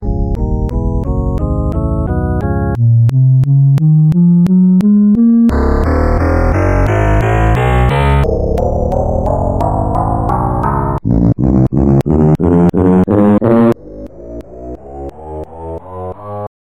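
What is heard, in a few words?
A sharp harmonic minor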